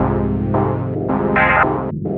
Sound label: 110 Fm, Melodic Loops; M8; Electronisounds